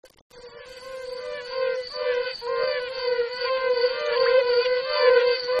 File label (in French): chant bombina